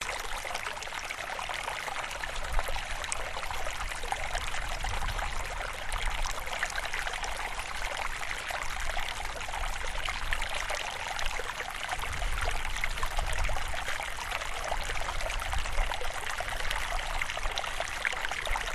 little stream flowing water; valle de las animas; la paz; your free sounds

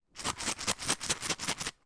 wallet with coins inside shake; foley

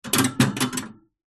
animals mouse cage metal cage hatch open

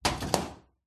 office metal cabinet door close

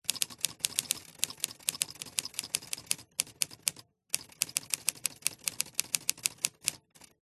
typewriter sequence typing burst; dpa4060; PMSFX

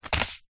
seeds packet black chia set down on wood counter; food

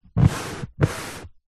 chair leather body movement; office

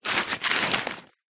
foley paper sheets handful pick up grab

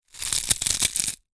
food potato chips crisps crush; zapsplat

